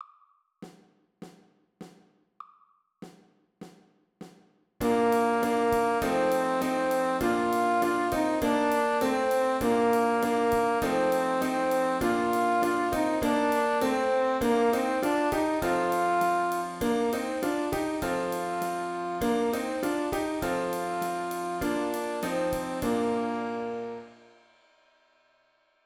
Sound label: Old English Sax